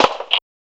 ROLL SNA; Rarefaction - Poke in The Ear With A Sharp Stick

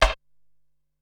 Wooden Hit, Drum Machines Ensoniq SQ-R-Plus; 300 Drum Machines

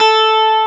GUI STRATO, E-Guitar; E-GUITARS

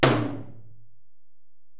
Tom, Alesis DM-PRO; Toms

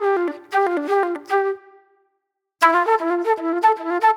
Flute, Disco

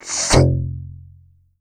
tsook, tubevox dry; Q Up Arts; Denny Jaeger Private Collection

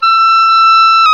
OBOE, Orchestral Winds, Oboe mf f

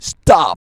VOX, Singin

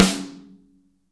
SN AMBIENT, Real Mega Drums

